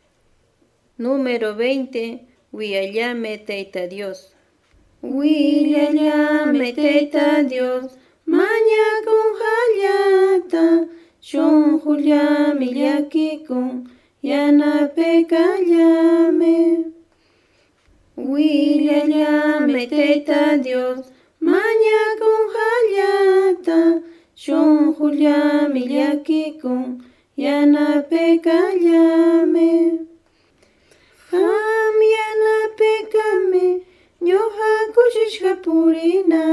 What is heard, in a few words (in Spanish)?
cancion